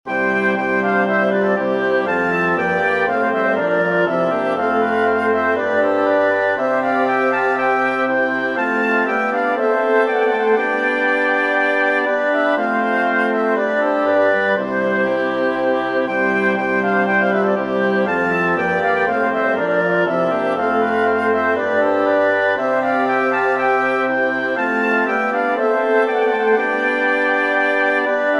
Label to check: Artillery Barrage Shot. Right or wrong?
Wrong